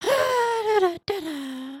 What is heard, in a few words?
foot rock; emotes